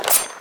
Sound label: draw knife; firefight